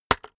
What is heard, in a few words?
keyboard external enter hit fast